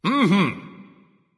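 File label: nonverbal agree; smite; voicepack; ullr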